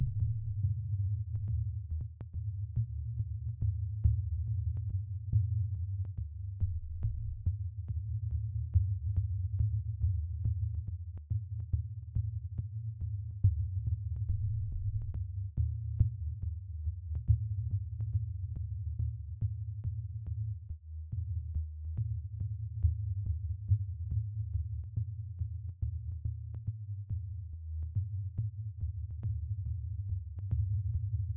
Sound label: low drum slow